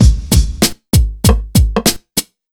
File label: 97 BEAT, Pure Electro; USB Soundscan vol.03; AKAI